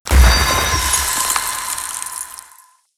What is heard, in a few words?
ice prick buff